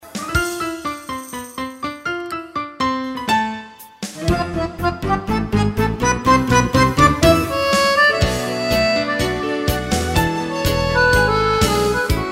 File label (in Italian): tango